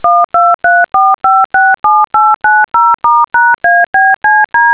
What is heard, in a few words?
DTMF tones -1dBm